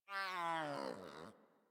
Kitten Yawn; Enemies; Warframe; Catbrow

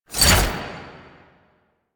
Whoosh D, weapons; Warframe; Gunsen Prime

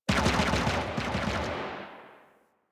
Fire B; The New War; New War Intro Moon; Rail Jack

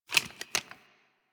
Clip Foley, Rifles; Snipetron

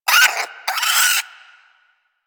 Vocal Alerted, Hunting Critters; Warframe; Cryptilex

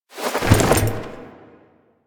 APC Jump Off Back Left; Enemies; Lasrian; Warframe

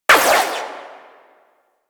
Shot, Double Barrel Shotgun; Warframe; Tenno; Tigris Prime

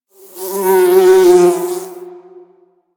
Exterior Foreground Bug Flyby, Ambience; Warframe; Duviri

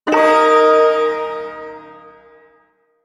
Ersatz Shawzin Chromatic Chord; Music; Chords

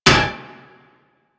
Melee Hammer Impact Generic; Warframe; Weapons; Hammers

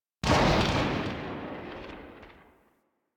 Quill Dart Gun Tail; Warframe

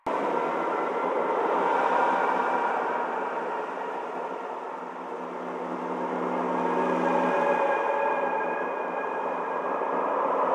Cave Head Display Loop; Ambience; Warframe; Stalker Cave

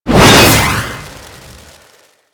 Jet Polearm Whoosh, weapons; Grineer

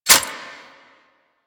Reload D A; Warframe; Zylok Prime

